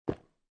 Swallowing Liquid, Human, Swallowing Liquid, Single Swallow Of Water; Drinking